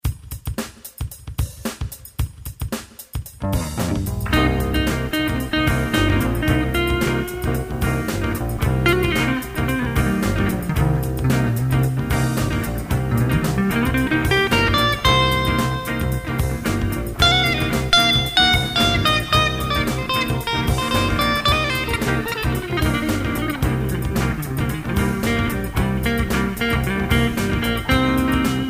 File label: Funk